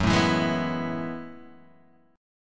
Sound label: Gb Minor 11th Gb down Guitar Half Step Down